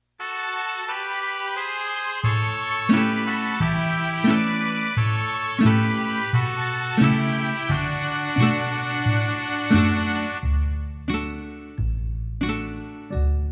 Huapango Mariachi, ritmos

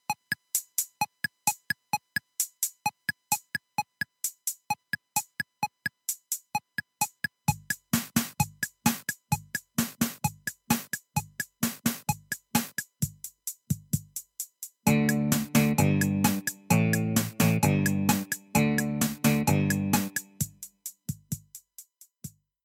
Pop 80s, ritmos

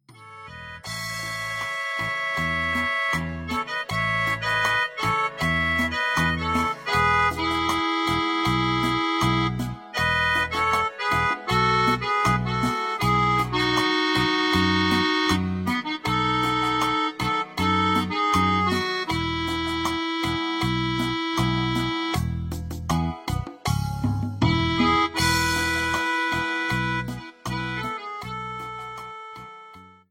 norteno; ritmos

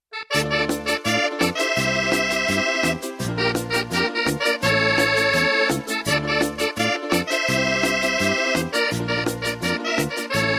Andina, cumbia; ritmos

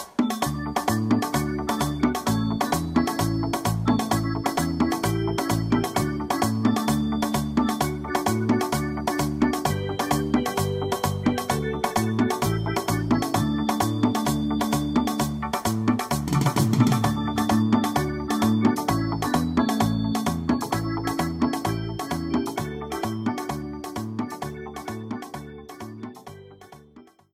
Punta; ritmos

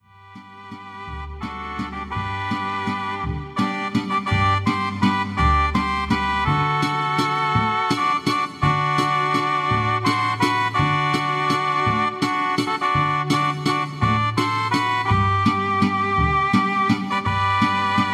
Mariachi

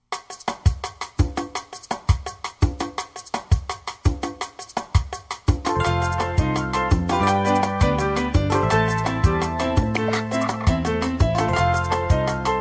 Salsa Canela

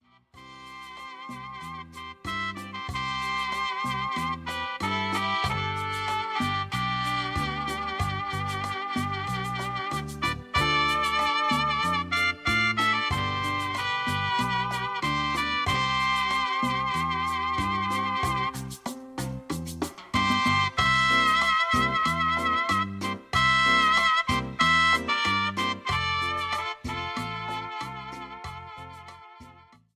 Santanero, ritmos, tropical

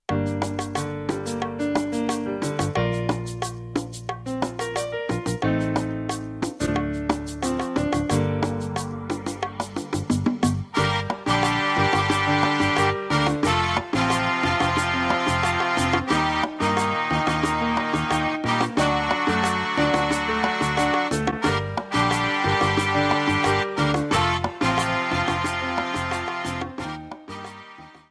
Salsa

